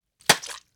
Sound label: cake wet splats; prosoundfx